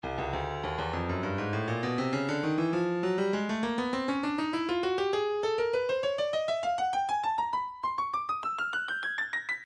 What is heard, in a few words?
breakbeat 100 bpm; partay d; Stylus Breakbeat Midi Files